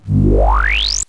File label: Forcefield Enable; cirnogodot; Artninja